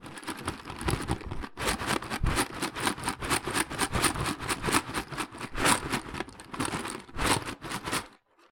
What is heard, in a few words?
pasta box shaken